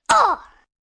Impact Short Oh, Grunt, Impact, 1 boy, short, quick hit; Pain Sound Effects